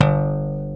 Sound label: slap bass; E-MU Formula 4000 Series Vol. 3; Analog Odyssey; VK+ Instruments